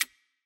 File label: Empty Barrel